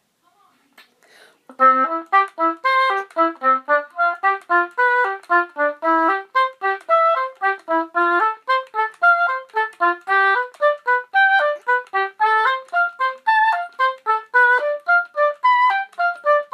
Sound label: oboe